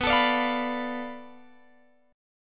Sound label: B-Minor 7th Flat 5th B down Guitar Open F; Bm7b5 strummed